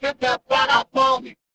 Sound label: feedback level up